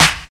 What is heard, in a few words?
00s Present Acoustic Snare D Key